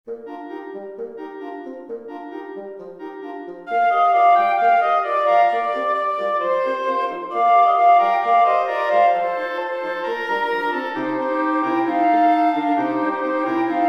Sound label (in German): blaeserquintett